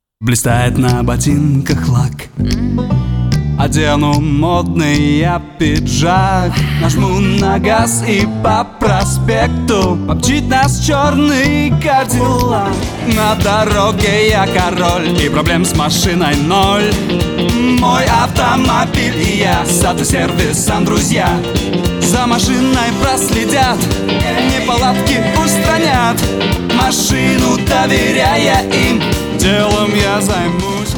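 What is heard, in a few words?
vocal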